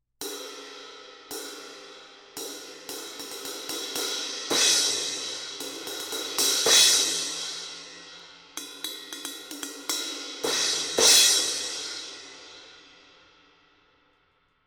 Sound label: Bosphorus